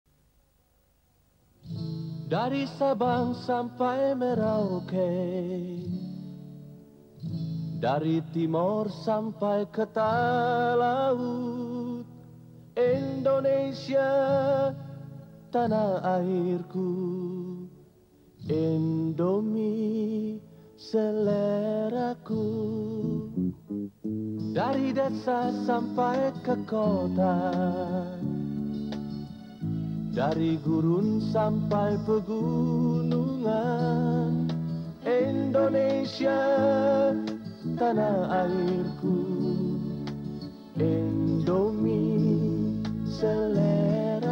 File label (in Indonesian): jingle indomie